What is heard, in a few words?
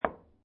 Billiard Pool Snooker Put Ball On Table; Sports